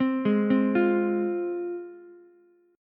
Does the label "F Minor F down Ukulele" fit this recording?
Yes